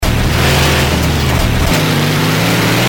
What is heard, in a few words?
turbo, truck; brrr truck; sentry; manster